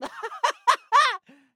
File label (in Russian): Смех; Лусио